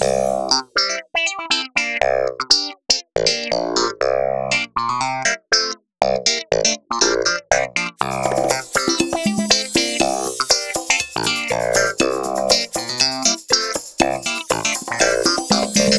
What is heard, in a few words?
CASUAL THEME 2 - LOOP 2; FREE CASUAL MUSIC PACK